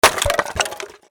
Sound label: wood chop; cleaver